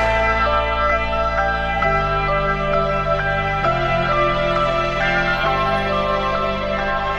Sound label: transition, electronic loops